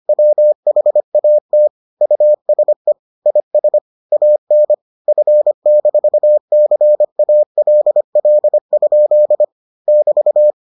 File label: morse